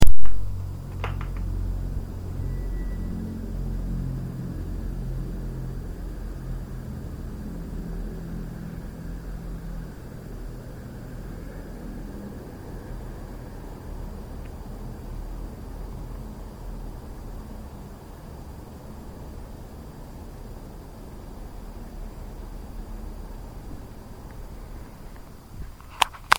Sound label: Sound of Tu-95 airplane